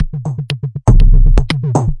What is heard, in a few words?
120 the surprise bass, drum loops and breaks